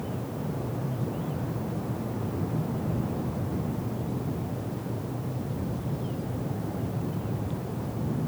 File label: Light Wind; Redmatch 2